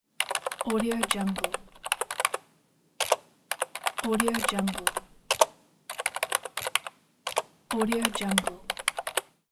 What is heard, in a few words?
computer keyboard typing